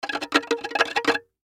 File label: Violin Pluck Fast, Violin Pluck Fast Creepy; Cartoon; Musical; Cartoons; Blastwave